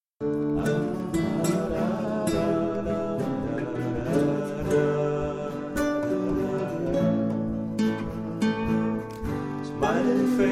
Trio Impro

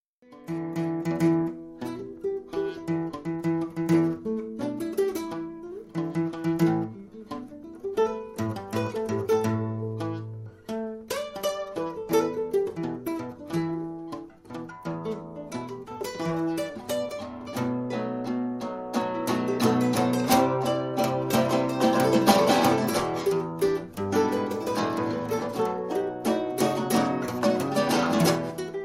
Trio Impro Blues

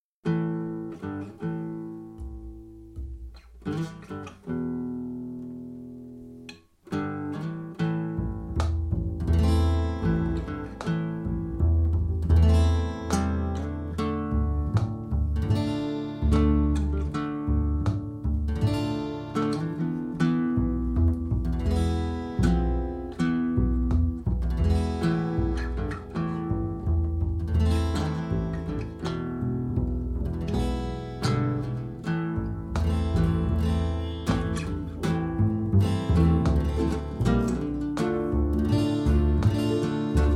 Trio Blues Spanishblues

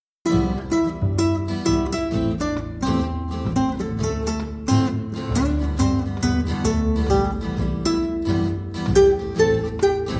Trio Jazz

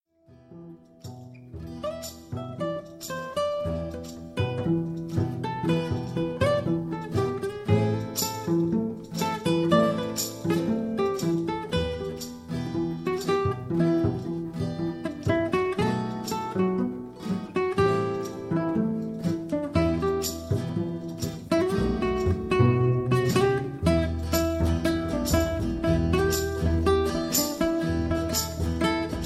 Trio Bassmania

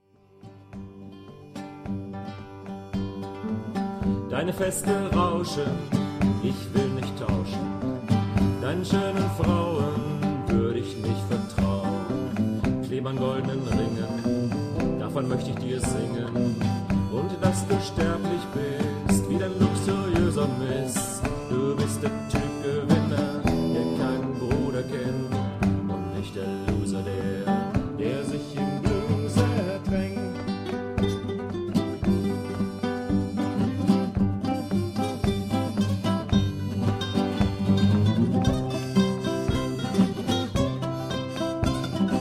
Trio blues

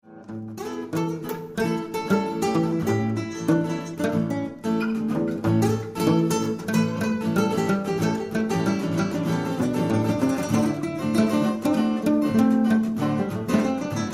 Trio Impro

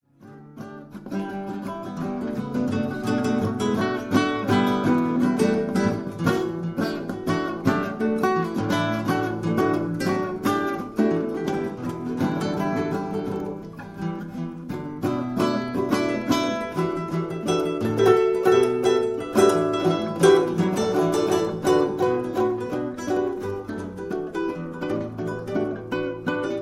Trio Impro

